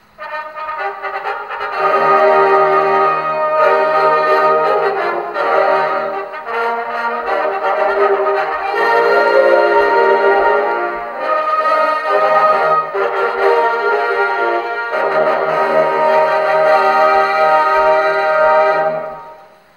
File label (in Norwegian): OL fanfare; 1952; JohannesHanssen